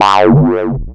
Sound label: attack